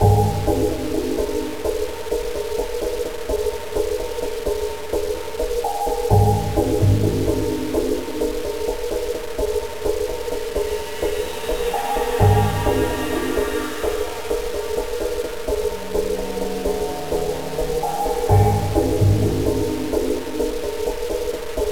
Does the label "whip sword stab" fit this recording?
No